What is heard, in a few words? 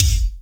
SMASH KICK; Big Fish Audio; Synth City